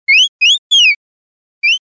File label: bird; JBao Magic Box